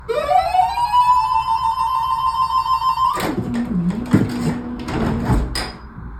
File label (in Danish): Y-tog afgangslyd; På afgangen fra Vicotria Street Station (VLTJ); Otto von Gehren